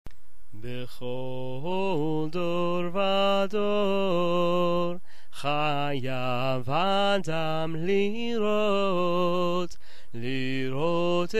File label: Seder songs; In Every Generation